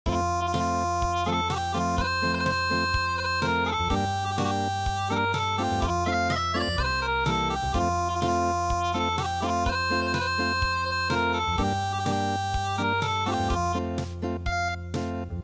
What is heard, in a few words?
Unplugged